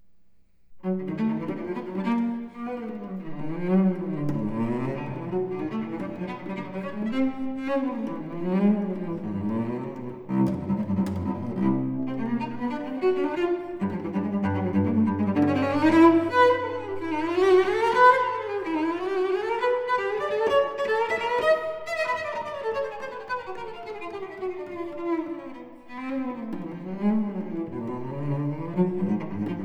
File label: Suite for solo cello, Moto perpetuo; Britten; Violoncello Solo